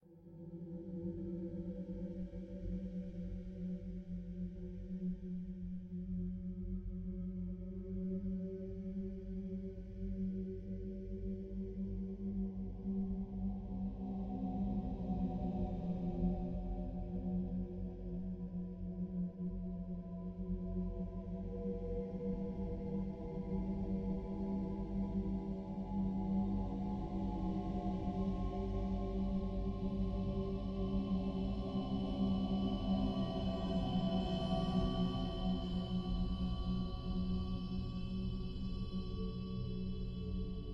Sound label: Intro Music; Granny 3; Android